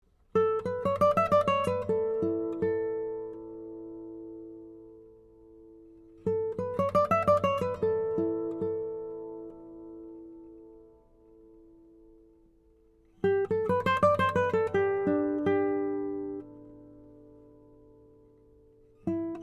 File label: Resonance